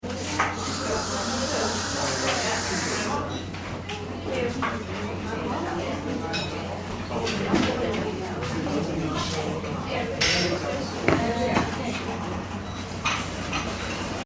ambience restaurant cafe england small busy; Cafeteria ( Small ) Ambience - Busy Cafe With Approx 30 People Inside - People Chattering; McKinney Sound

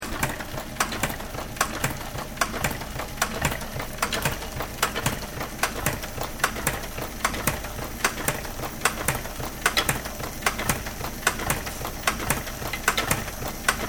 Industrial Machine Metal Press Operation Max Loop; Various industrial machines; Machinery

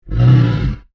Dinosaur Snarl, Creature; Animal; Animals; BlastWave

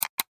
Technology Mouse Click Computer; Computers; Electronics

